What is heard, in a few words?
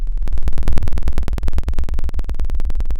pop, Sound of a cat purring